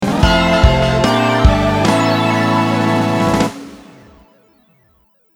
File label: victory